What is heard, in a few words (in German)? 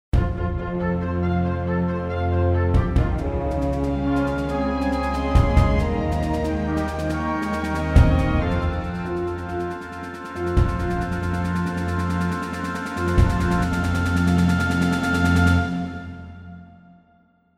Mollfanfare, klingelton